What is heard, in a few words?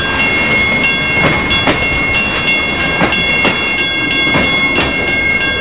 El Train Run; Museum of Anything Goes